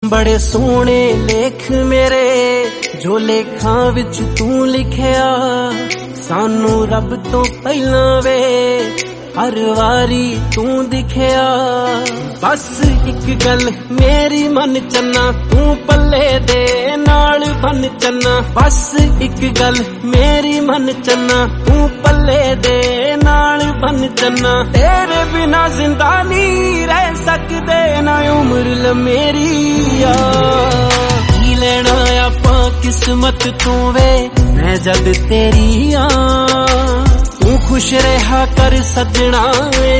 sad, punjabi

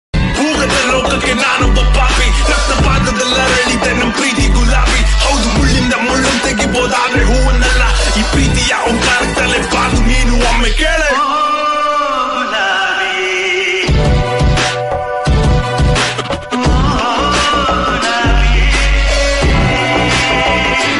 kannada bgm

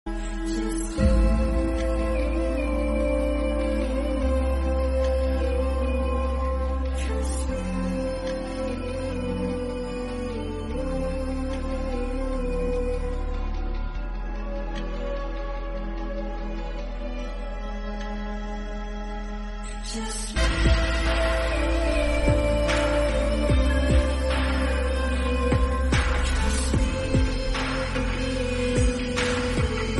korean song

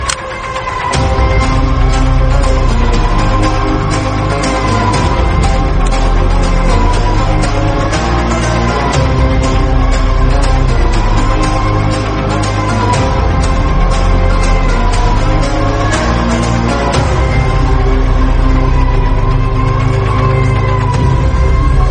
killer entry music; kgf

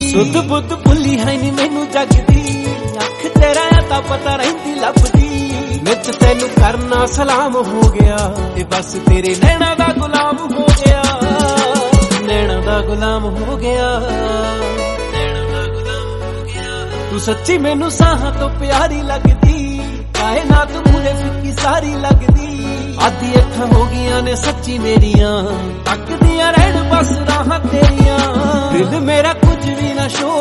lofi, punjabi song

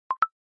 dust notification tone; vivo

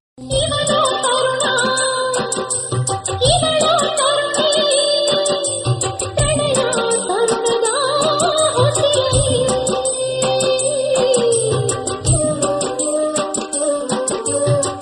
male song kannada; kannada movie songs

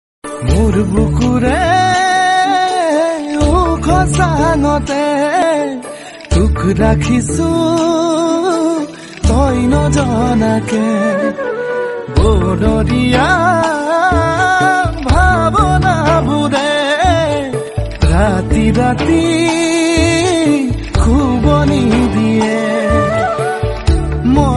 assamese song

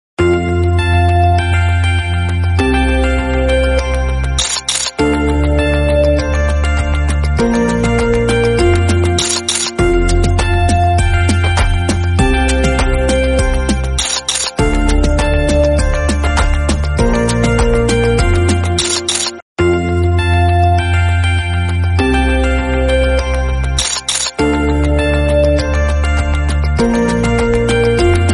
techno background music

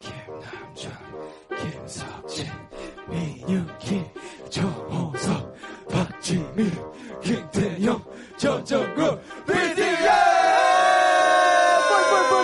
fanchant kpop

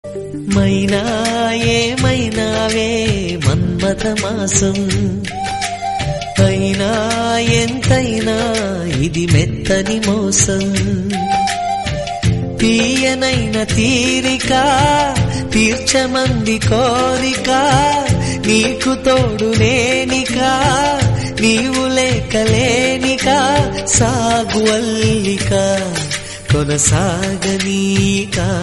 telugu song; maa annayya

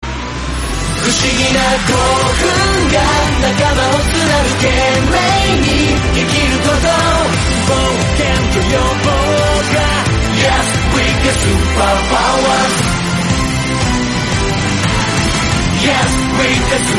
japanese song; One Piece

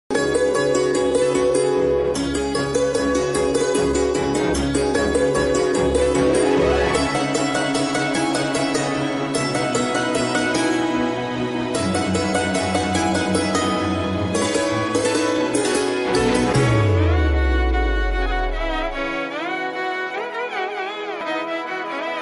love bgm; backbenchers web series

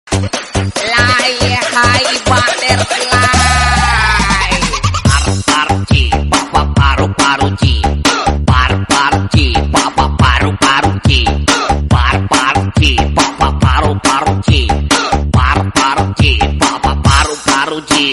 bgm, remix

